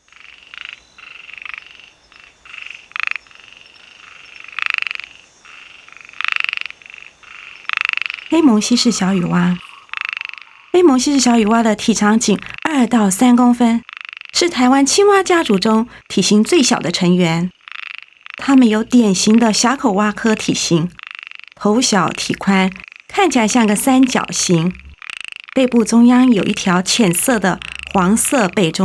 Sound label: Microhyla heymonsi